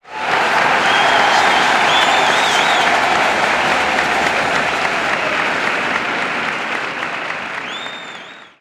Audience Sound; Monopoly Streets; Wii, Xbox 360

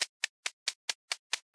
Footstep Run Loop

